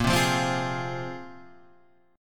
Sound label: A sharp 7th Suspended 2nd, Guitar, Standard; strummed; JGuitar